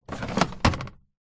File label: household washing machine drawer tray slide pull out